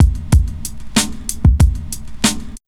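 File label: 94 Drum Sound, Pure Electro; USB Soundscan vol.03; AKAI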